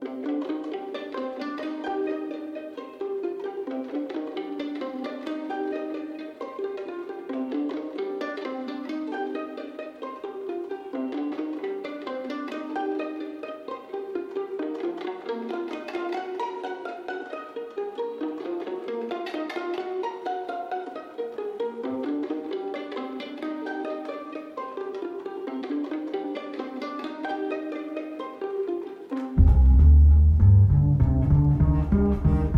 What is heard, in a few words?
Nightshade (Pizzicato Strings & Walking Bass)